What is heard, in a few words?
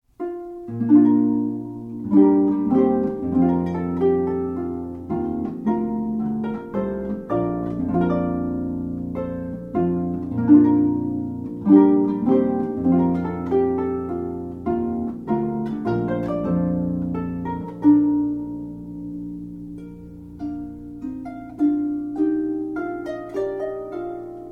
pavane